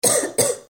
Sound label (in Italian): tosse; personeFX